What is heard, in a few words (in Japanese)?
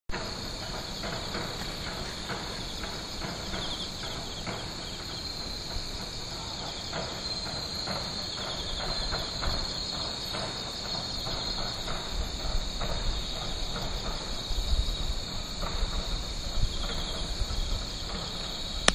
maturi